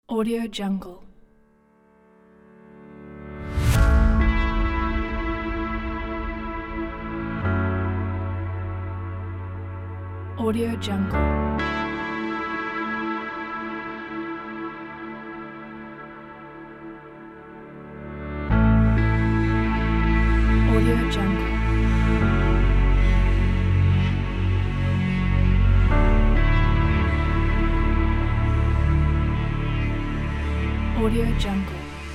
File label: cinematic drone inspiration music